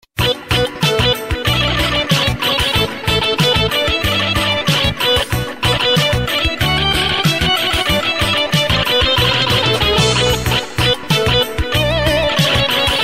Balkan beat

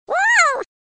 Best Msg Tone

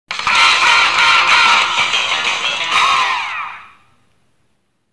Victory; splatoon

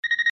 Fast Pulse; Executive

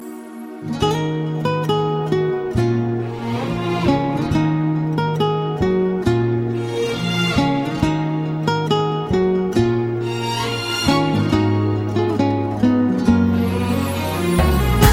Guitar

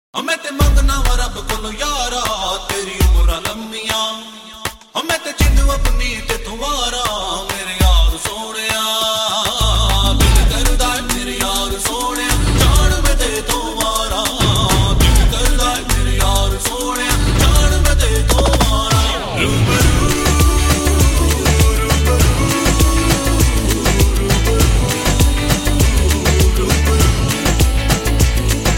Bhangra

